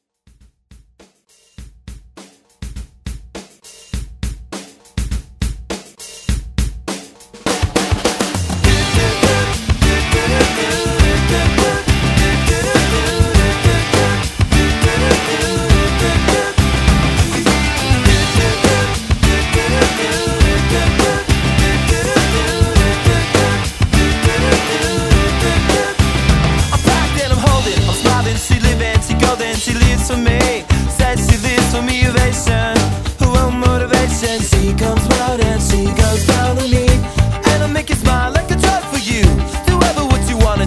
90s Pop Rock; Redrum

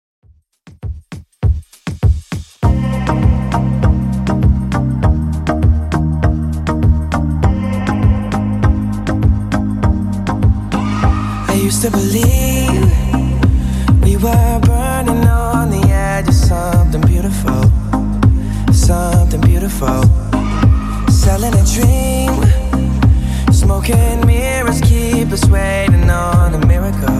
100 bpm

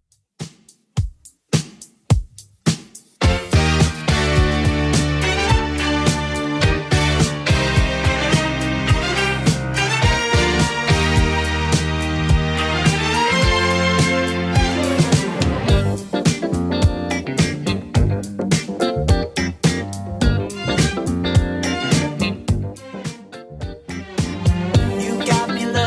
70s Redrum 106 bpm CLEAN

